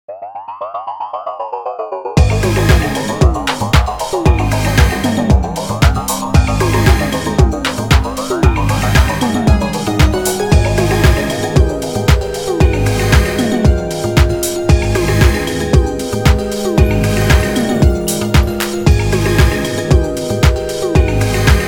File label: inst